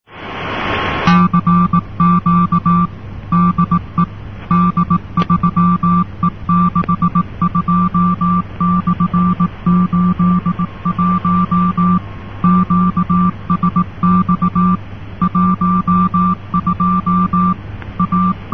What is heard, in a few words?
cw; africa